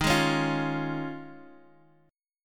Eb 7th Suspended 2nd Suspended 4th Eb, Guitar Half Step Down; Eb7sus2sus4